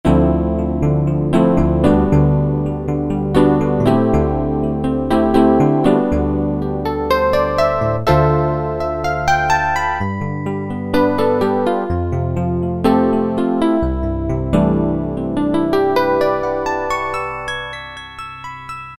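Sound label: Electric Piano, Roland D-110; Factory Patches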